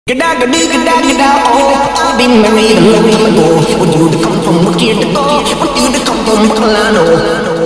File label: aaaaahhhhh